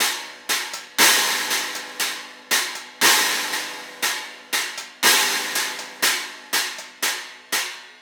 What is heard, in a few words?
Cymbal Pattern; Sonic Foundry (Sony Creative Software) - Essential Percussion